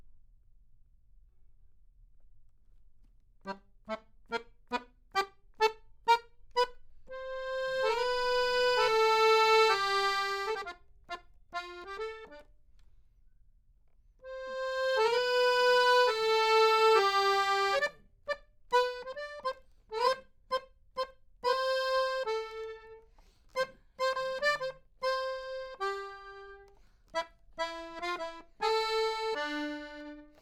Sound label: Accordion; Jazz